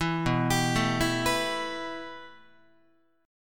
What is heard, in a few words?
B 7th Suspended 4th Sharp 5th B down Guitar; B7sus4#5; arpeggiated